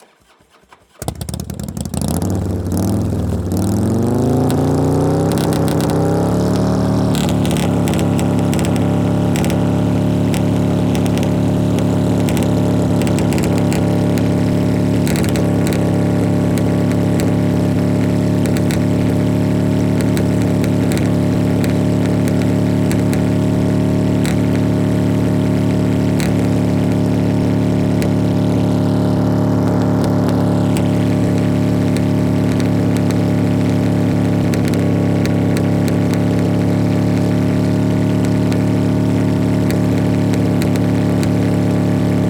motorcycle, Fat Boy, straight pipes, tuned engine, pipe side, throttling, high; Eiravaein Sound; Anarchy